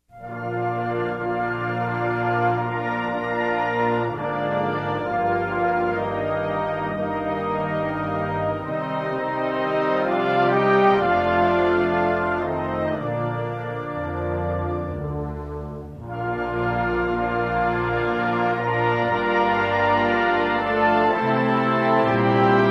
Luxemburg Hymne

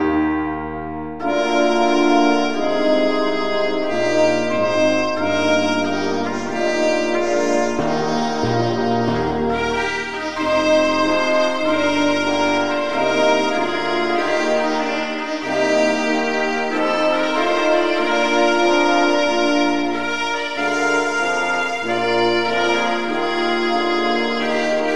Portugal Hymne

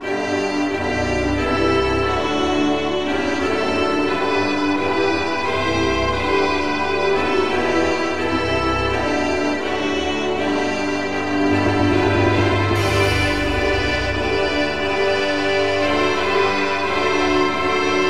england hymne

